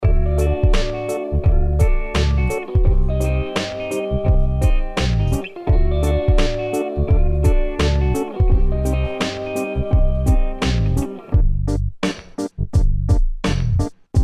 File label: Country Hiphop